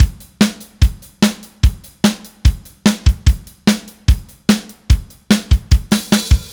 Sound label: Silos Beat Fill, Indie Rock; Sonic Foundry (Sony Creative Software); Indie Rock Anthems; Session 11 - Silos